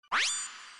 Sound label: codec on; mgs